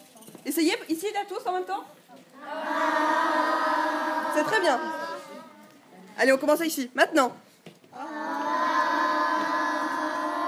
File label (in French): chorale vocalises la vague